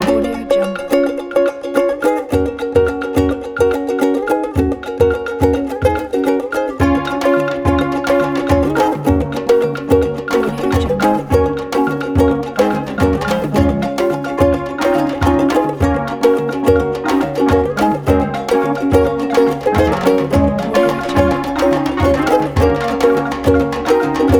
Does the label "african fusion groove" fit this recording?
Yes